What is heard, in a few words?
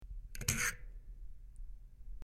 Dial Switch Metal